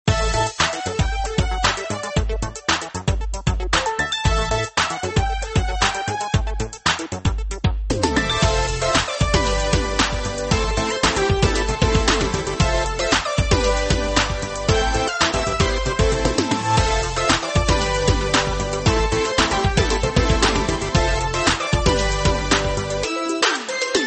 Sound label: music; inlogic-basketballmaster